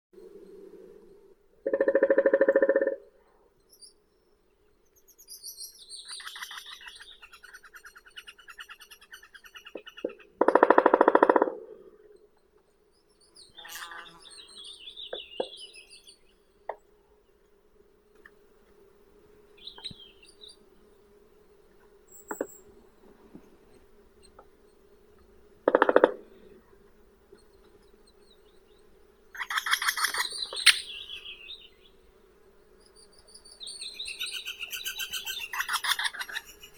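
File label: three-toed woodpecker, Picoides tridactylus; dyatel trehpalii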